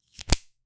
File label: flip of a single magic, cards